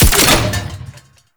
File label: Sniper Alt Fire Mech; Frumentarius; Exalted Sniper